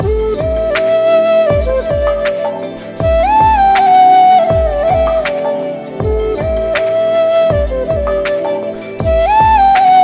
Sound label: flute